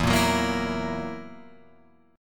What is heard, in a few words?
E Suspended 2nd Flat 5th E down Guitar Open F; strummed; JGuitar